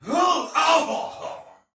Death, Soldier Male; Dialog; Barks; Battle; Sinecure

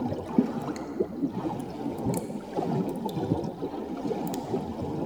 Cork Water Pressure Loop; Neathuns; Puzzles; Bean Stalk

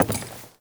Walk Wood, Footsteps; Characters; Enemy; Lady Deora

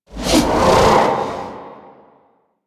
Spear Gun Throw Charge; Weapons; Warframe; Tenno; Hoplite

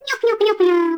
Baby Vocal Tired; Warframe; Nokko Colony

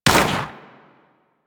Fighter Fire; Warframe; DE; Vehicles; RailJack; RailJackEnemyShips; Grineer; Space Fighter Rager Agent

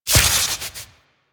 Vial Poison Shatter; Warframe; Alchemist

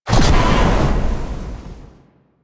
Void Dragon Firebreath Mech; Warframe; Duviri; DuviriVoidDragon; Weapons

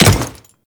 Default Step, Footsteps; Warframe; Grineer Royal Guard

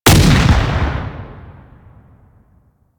Dual Pistol Shot 2D; Grn Queen Guard Dual Pistols

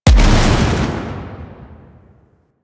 Rocket Launcher Explosion

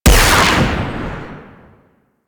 Rifle Secondary Shot; Warframe; Weapons; Wisp Rifle

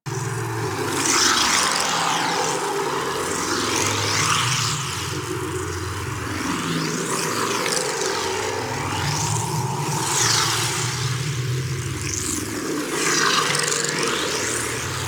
Portal Loop A; Ambience; Orokin; Warframe; Orokin Moon

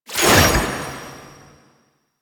Rune Pickup; Warframe; Entrati; Mirror Defense

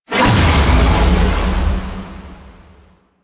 Ring Shockwave; Warframe; The New War; Archon; Nira; Abilities

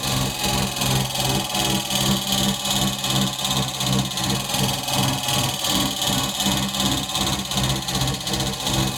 Gas City New Fan Loop; Ambience; Props; Warframe; Corpus Gas City; Props Remaster

